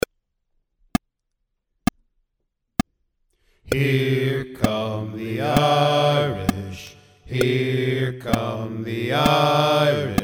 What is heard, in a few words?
Here Come The Irish Cheer